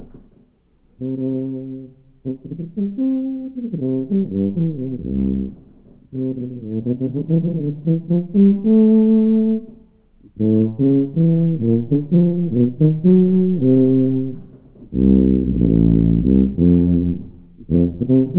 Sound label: warming up